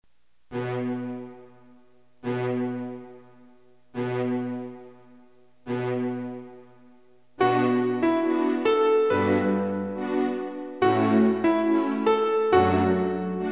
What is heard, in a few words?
Piano; EOS B500